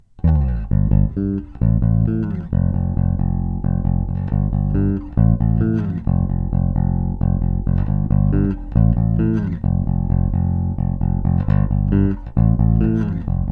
entrambi dita aperto

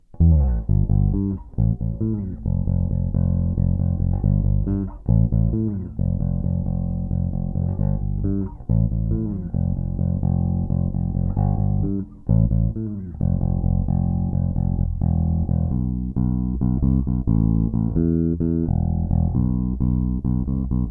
entrambi dita chiuso

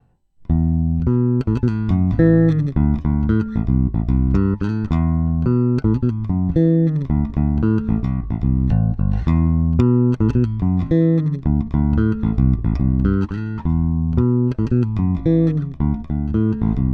JBA54 VS 60s Entrambi dita aperto

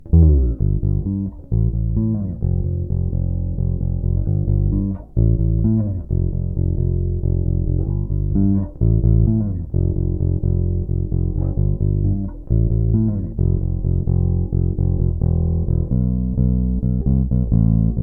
manico dita chiuso

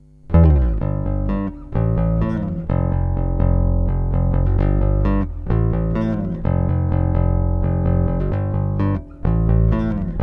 jba54 manico plettro aperto

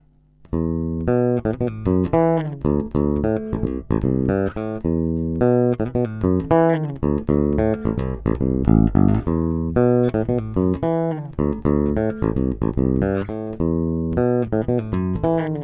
JBA54 VS 60s Ponte dita aperto

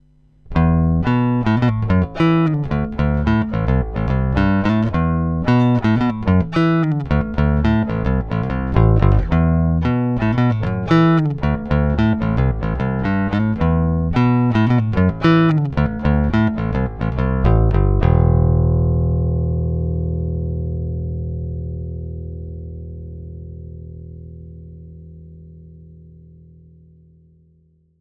Manico plettro aperto; JBA54 VS 60s

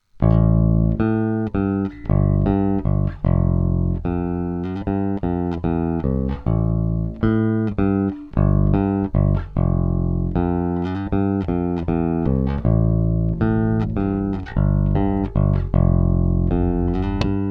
dita tono aperto

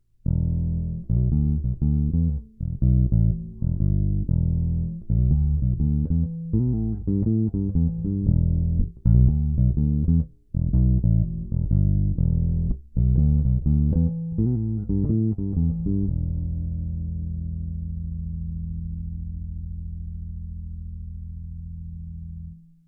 pbneo4 dita tono chiuso

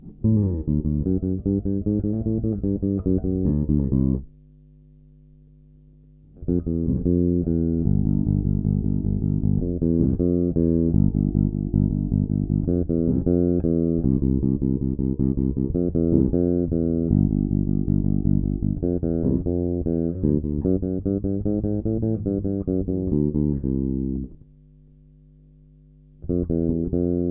ponte dita chiuso

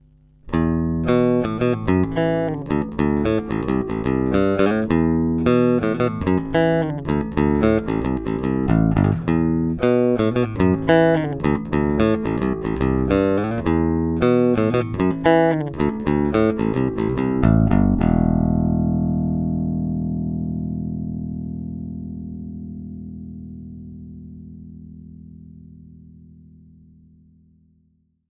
Ponte plettro aperto; JBA54 VS 60s

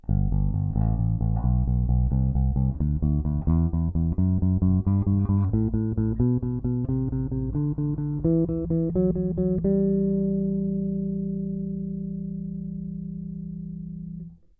entrambi dita chiuso

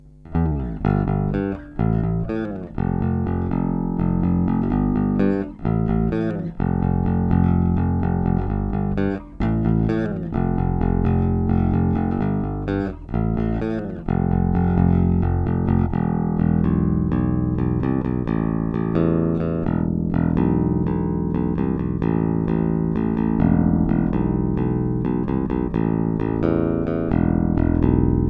ponte plettro aperto

